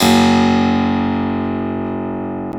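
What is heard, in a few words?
Harpsichord; CMI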